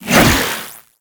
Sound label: Briefcase Katana Whoosh; Warframe; Corpus